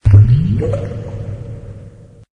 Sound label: music drop, notifications; Samsung GT i7680